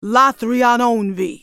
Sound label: ultimate effort; Karma; Character Voices - English